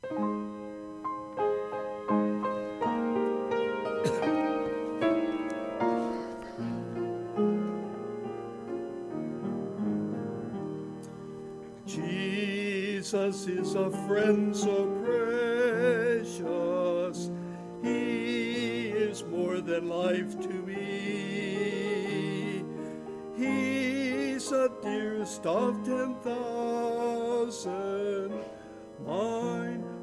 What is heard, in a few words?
Hymn Medley